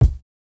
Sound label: kick, house